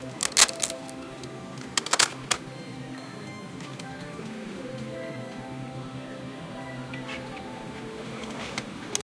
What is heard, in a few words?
the sound of cameras and, buzz